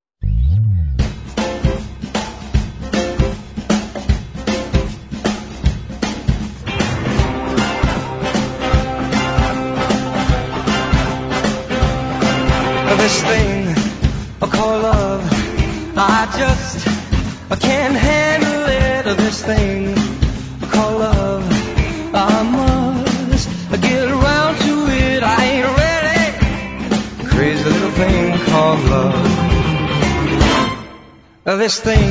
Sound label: swing